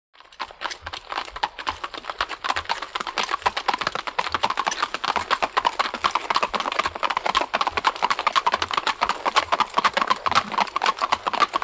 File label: By 3 Horses Up Past At